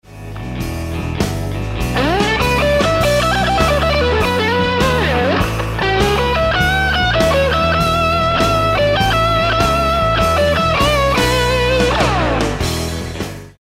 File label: Lick, guitar